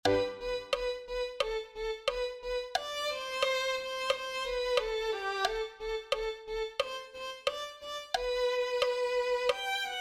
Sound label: violin 2